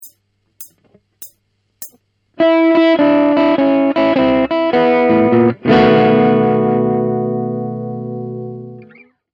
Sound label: blues